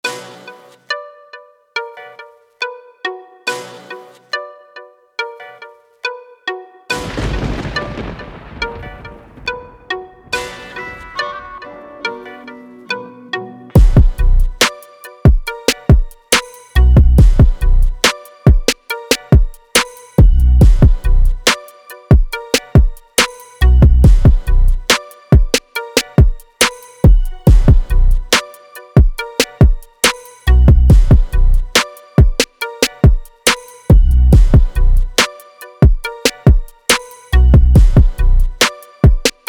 DRUMS

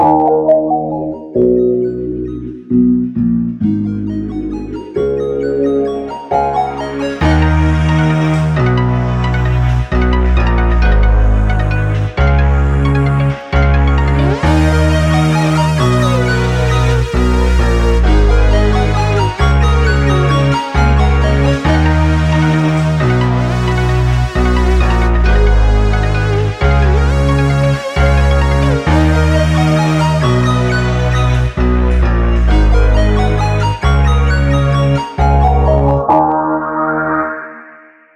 Vintage Hip Hop

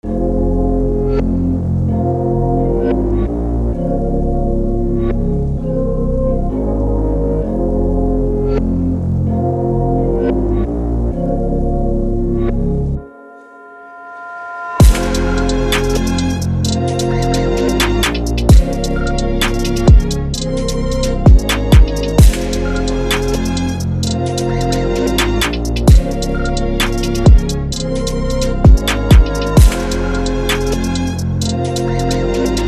Drill

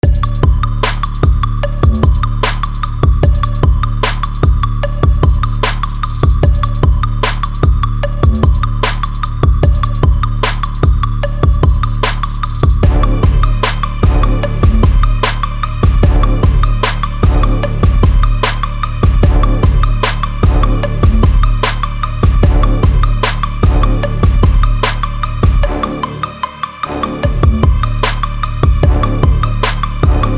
75 BPM

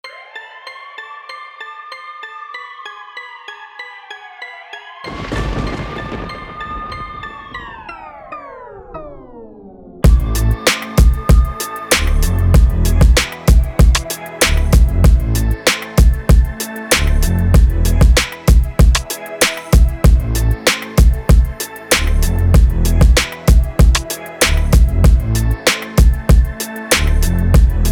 Trap

